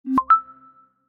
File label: notification